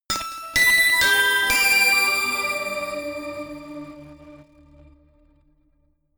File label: notification glockenspiel crazy sequence jump; instrumente